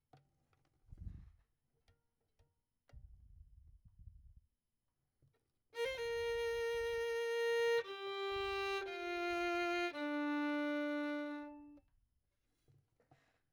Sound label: Violin; Verdigris Music